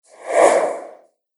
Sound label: Swoosh Whoosh; Whoosh Sound Effects; Swish, Zoom, Swipe, Whoosh, Swoop, Transition; Andrew Fleming